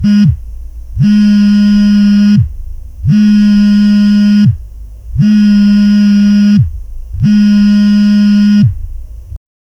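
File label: realistic mobile phone vibration, mobile phone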